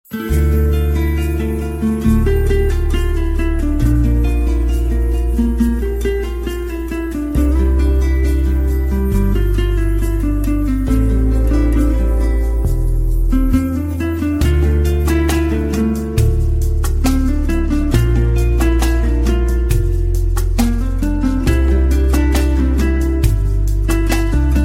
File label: Valentine Guitar